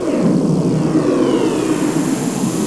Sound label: NEUTRON PU, MACHINES